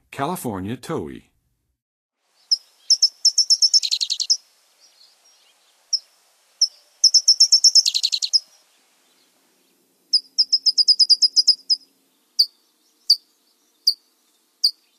California Towhee, Songs of Birds of North America; Western USA; Western USA Songbirds